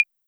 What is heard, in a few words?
CLAVE